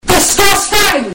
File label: low gain; desgustang